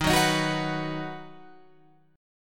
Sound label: Eb Minor Sharp 5th Guitar Open F; Ebm#5 strummed; JGuitar